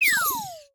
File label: cash stack sym fall; pork knox